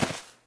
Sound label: grass step; Golden Crayon Sounds